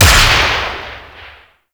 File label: Heavy Shotgun Projectile Explode A; Warframe; Tenno